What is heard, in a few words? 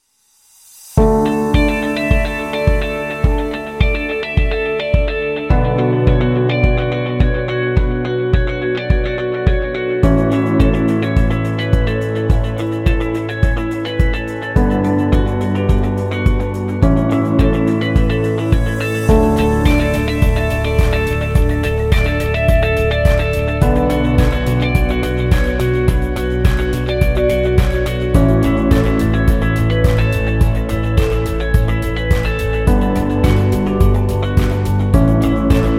Business Ambient; positive vibe